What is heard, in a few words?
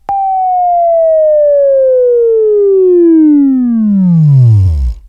WAV Left, Sweeping; Sweeping Training program